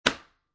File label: Foley, Book, Paperback, Dropped On Wooden Desk; Drop Sound Effects